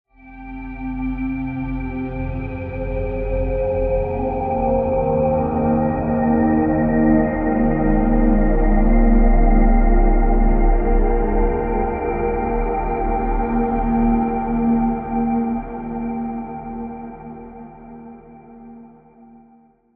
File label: Pad; Routed Sound; Spire Expansion - Flame Summoner Vol.1